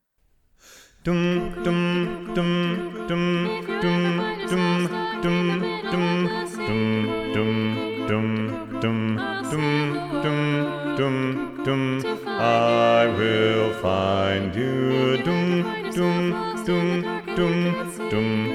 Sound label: BASS-1 SATB Bass 1 Predominant; BASS 2